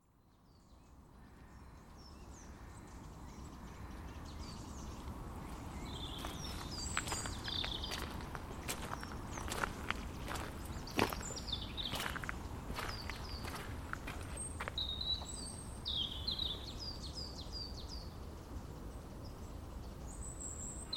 soundscape Clent birdsong, A short soundscape of the birdsong at Clent; I was soon disturbed by passing walkers.